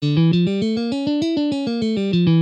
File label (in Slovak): D harmonicka mol a A ciganska dur cvicenia